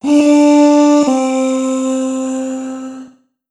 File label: Omnivox oneshot C, Vocal; One Shots; Slate Digital Omnivox Vocal Sample Pack